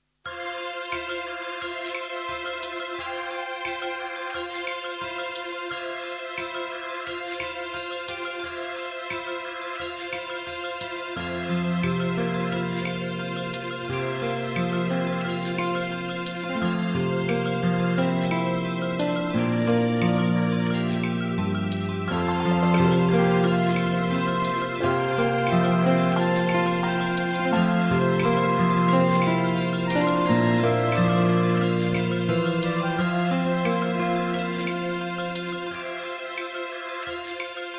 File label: fusion; Tijdens de patrouille, samen oplopen met een kudde gei; AG fusion